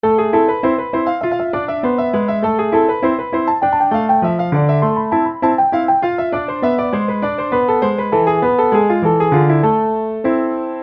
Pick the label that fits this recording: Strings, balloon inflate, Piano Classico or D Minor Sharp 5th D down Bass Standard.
Piano Classico